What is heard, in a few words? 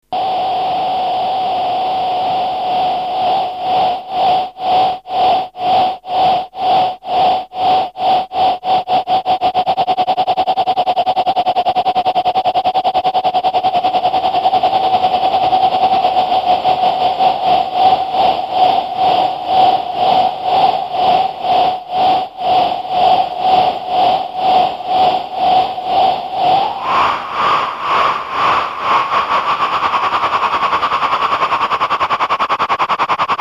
fx tremolo reverb